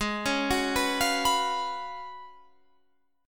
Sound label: F sharp Major 7th Suspended 2nd Suspended 4th, G sharp